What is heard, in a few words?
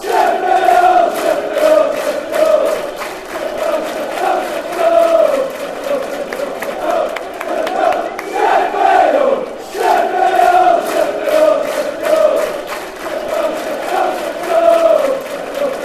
sheffield sheffield fanchants